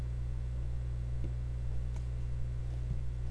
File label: hawk